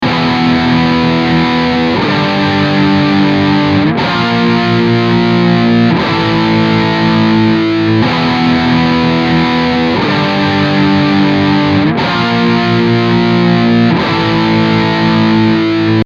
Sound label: BRIDGE NECK drive, eclipse active, line6